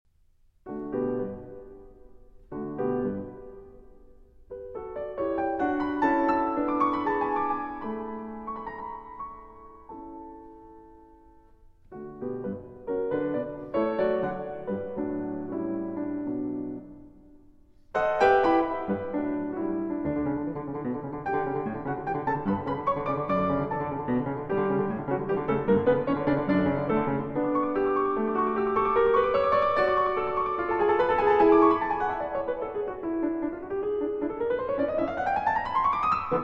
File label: Piano Sonata No. 7 in D Major, Op. 10 No. 3 IV. Rondo. Allegro; Beethoven